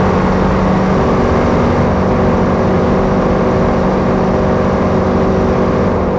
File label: fourth cruise, vehicles; gmod; tdmcars; impala 96